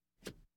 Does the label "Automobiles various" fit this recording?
Yes